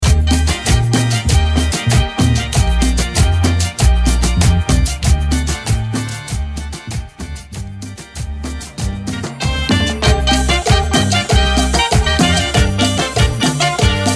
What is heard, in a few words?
Intro Outro Break 96 BPM